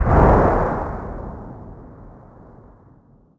hit far; World of Tanks Blitz

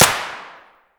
gyrza indoor silenced distant; Escape from Tarkov

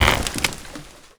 pull wood

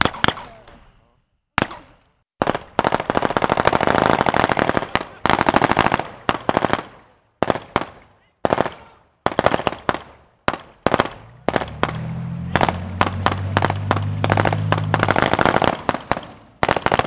Amb Destruction; Red Orchestra 41-45

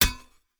2 handed cleaver strike soft; Rust

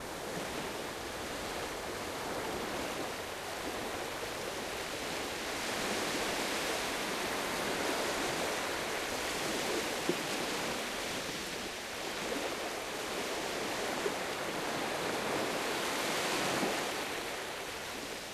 ambient port default; World Of Warships

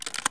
dmr drop; Escape from Tarkov

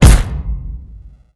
biomech punch hit

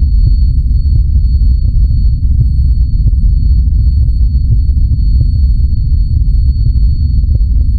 Aircrafts Cockpits Faint; DCS

